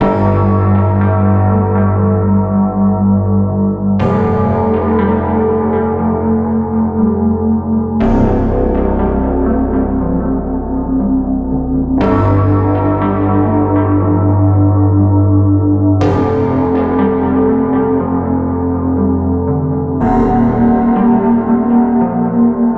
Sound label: 120 Gm full mix